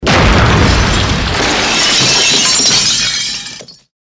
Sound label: combination impact crash